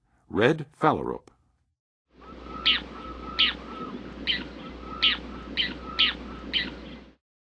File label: Red Phalarope, Birds of North America; Western USA; Western USA Songbirds